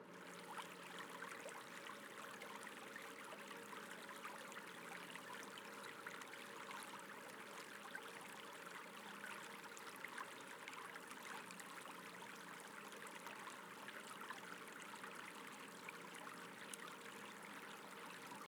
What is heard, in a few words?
Fountain of Prayers (BGS); Mementomori; Android